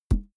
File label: balloon hit head